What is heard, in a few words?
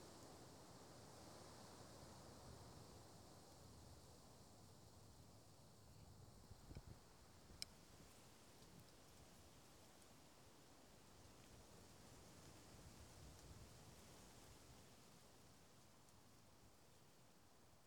Light Wind